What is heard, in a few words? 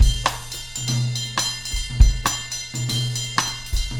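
120 beat; Big Fish Audio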